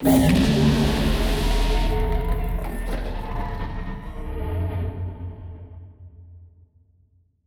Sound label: void worms juvenile death; Stellaris; grand archive; ships